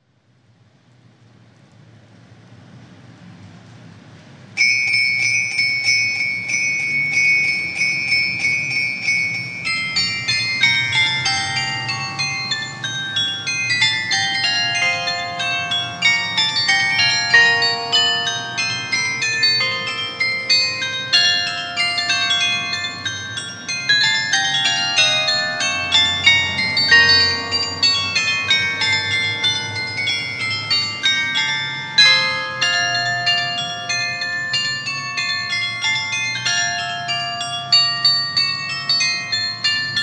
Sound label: carillon; Kerst